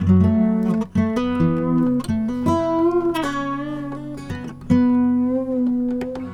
Slippery, Acoustic String; Sonic Foundry (Sony Creative Software); Friendly Fire

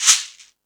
Latin Layers, Drum Machines E-MU Pro-Cussion

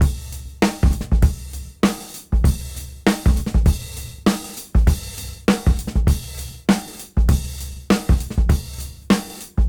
Swing 99 BPM; AMG - Keith LeBlanc Phat Butt Jams

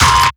Paper Kick; Sonic Foundry (Sony Creative Software); Esoterik Beatz; Paper Kit

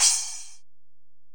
Ride, Alesis DM-PRO

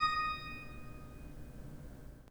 PIPE, 3DOrgans

